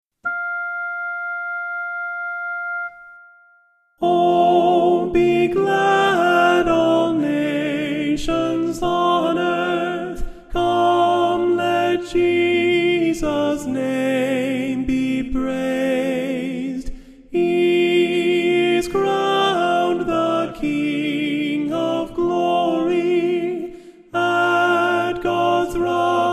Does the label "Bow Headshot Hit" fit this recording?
No